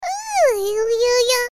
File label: Cry "Uyu"